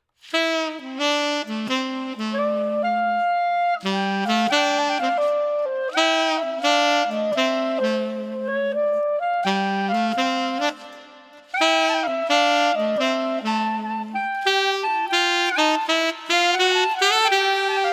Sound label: Street Alto Clarinet Drifting 85